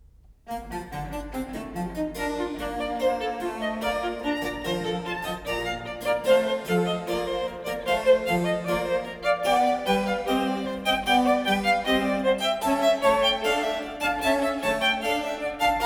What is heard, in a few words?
Trio Sonata For 2 Violins And Basso Continuo No.10 in B flat major; Vivaldi